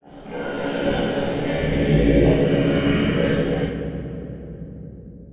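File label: distant growl, caves; ambient; Half Life 2; Episode 2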